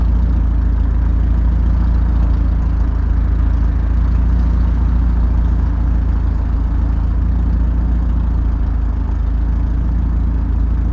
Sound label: Sen416 with outdoor kit with deadcat, Windnoise